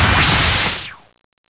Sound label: explode